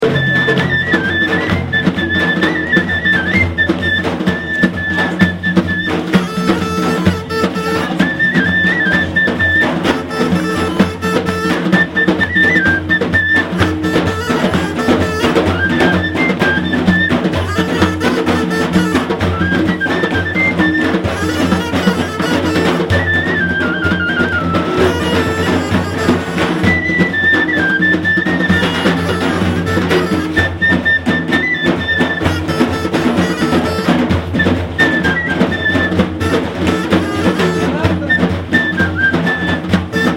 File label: flute duet; Iraq